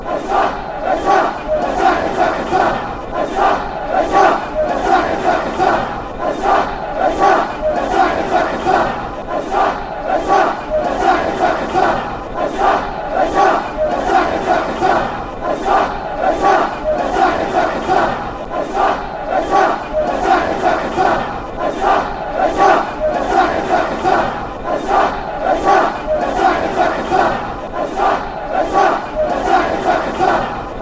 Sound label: attack attack, fanchants; mfc